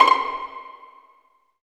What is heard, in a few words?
Vlns Pizz; Roland L-CD702; Vls Pizz